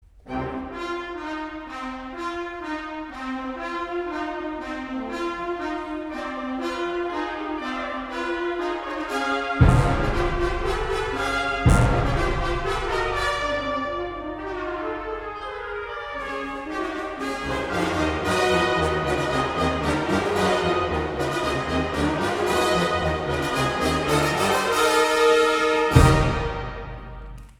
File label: fanfare band